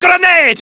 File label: grenade; mgs